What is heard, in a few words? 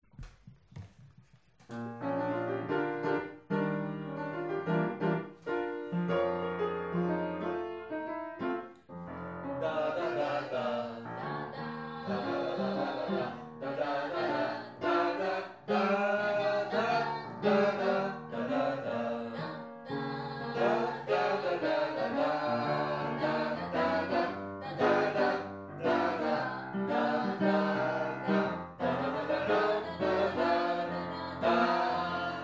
Jazz Chorus Blues